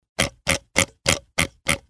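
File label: sound of mouse wheel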